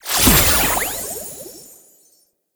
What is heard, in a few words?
Pickup Despawn; Warframe; Nokko Colony; Find Nokkos